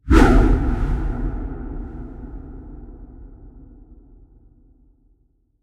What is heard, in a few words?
Swoosh; Treasure of Nadia